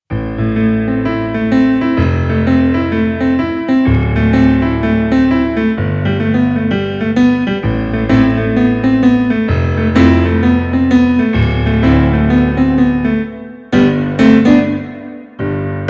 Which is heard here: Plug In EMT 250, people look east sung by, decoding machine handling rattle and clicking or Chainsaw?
Plug In EMT 250